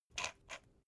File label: pepper mill assemble; berufe; gastronomie; soundpool